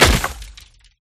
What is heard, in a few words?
ceramic break